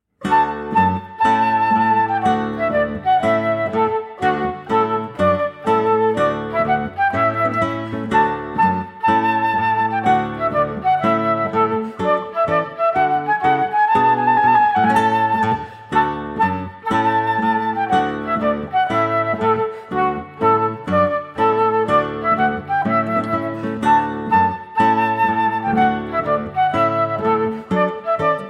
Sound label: Trumpet Tune